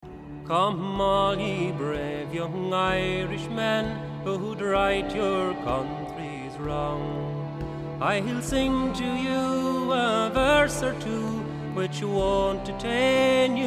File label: A Fenian Ballad; Rising